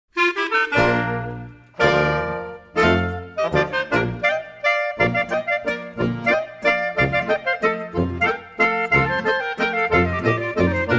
halbwalzer

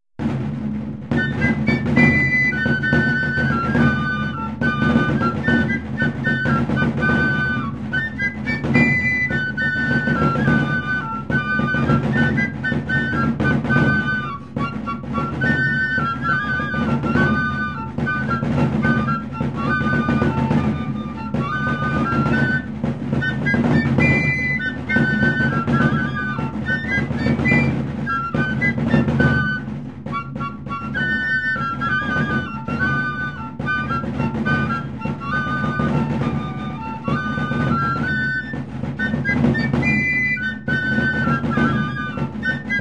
Historische Floetentoene Tagreveille

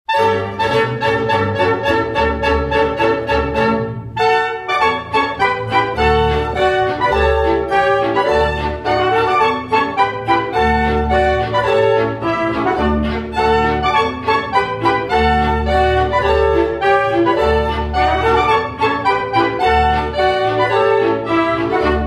Polka

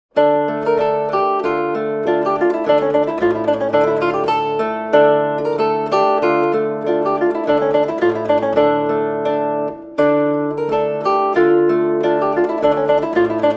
Griechische Volks Arie; Frasdorf

